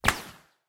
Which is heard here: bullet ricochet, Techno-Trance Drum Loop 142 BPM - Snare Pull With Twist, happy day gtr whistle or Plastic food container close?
bullet ricochet